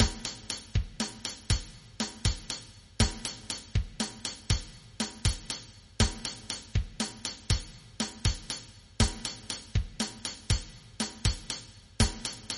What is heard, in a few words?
bikutsi; Traditional Rhythms; Creating Rhythms